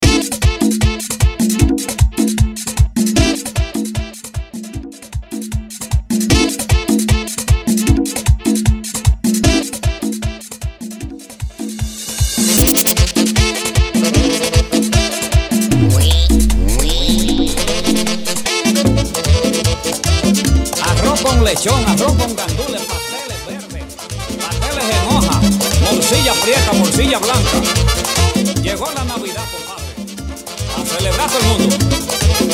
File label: Intro Outro Break 153BPM